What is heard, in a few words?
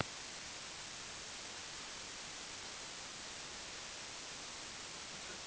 silence test